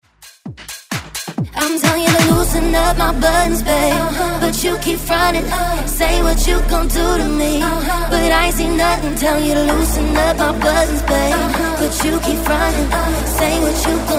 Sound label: Dance Redrum